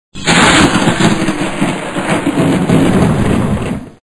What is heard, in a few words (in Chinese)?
打雷; 搞笑音效